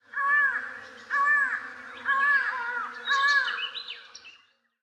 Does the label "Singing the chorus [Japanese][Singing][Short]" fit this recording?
No